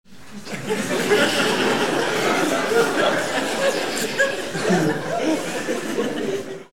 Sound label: laugh long